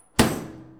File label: Door Slams; doorSounds